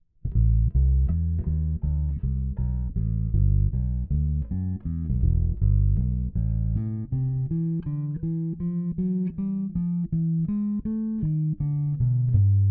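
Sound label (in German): Ibanez aktiv Neck Bass boost 250 Hz cut Treble cut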